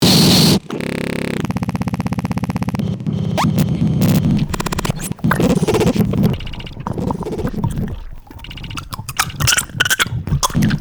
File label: Grunt